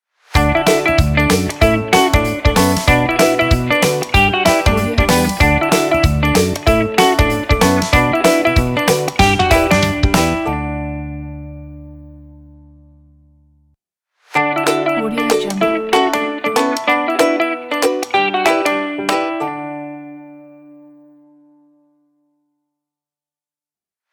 fun guitar intro logo

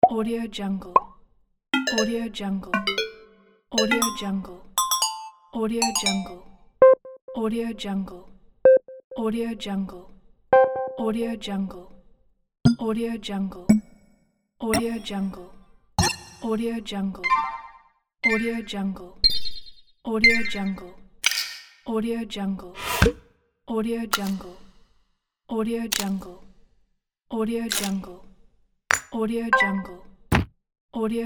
mobile games and apps menu ui sound pack

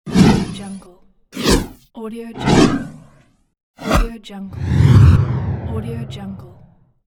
whoosh flanger; soundwood

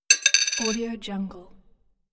coin droping

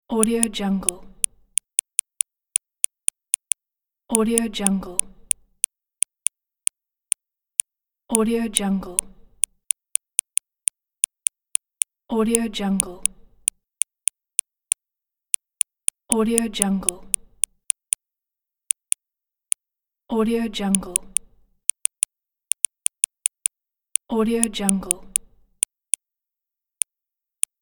text message typing ui handheld phone device

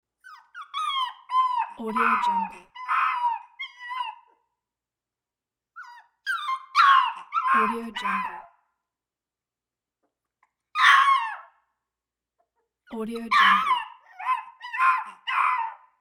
small puppy crying

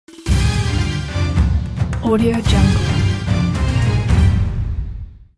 world music stinger

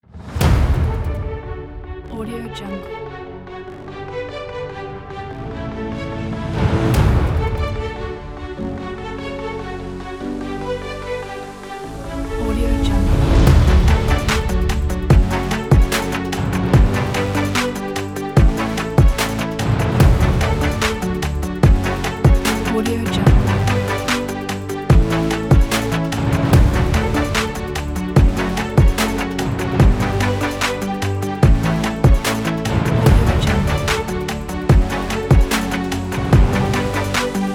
ambient cinematic electronica

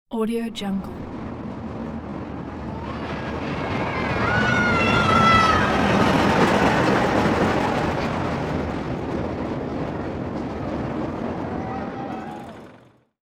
passing mid fast rollercoaster with people

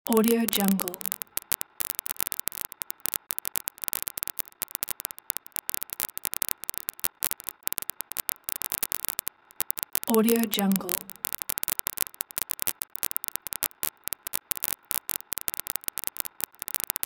vinyl noise

